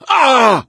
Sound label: hurt major, gambler, survivor voice; source engine; left 4 dead 2